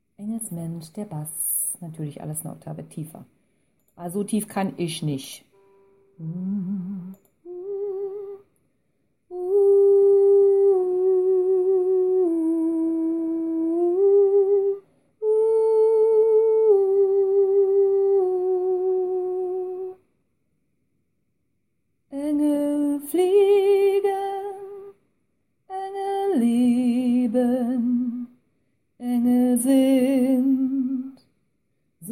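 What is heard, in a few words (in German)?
Engelsmensch bass, gospel choir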